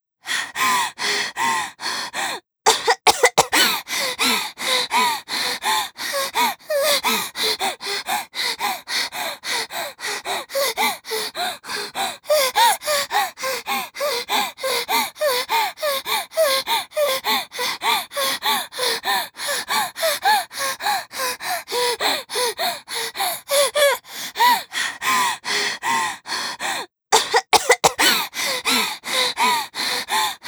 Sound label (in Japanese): 過呼吸 台詞のみ